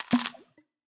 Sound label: ui room alchemylab potion buy